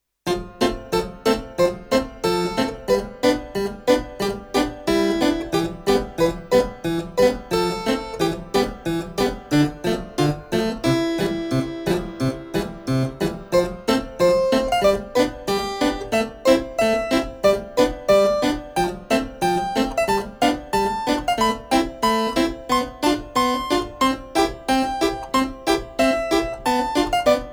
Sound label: Harpsichord